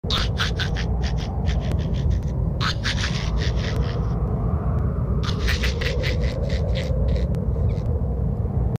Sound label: hahahaha; alien rindo